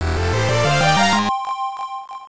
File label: Unknown Jingle; Teleroboxer; Virtual Boy